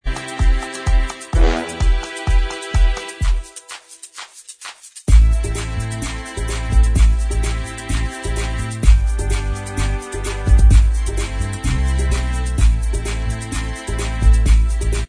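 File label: instrumental, backing track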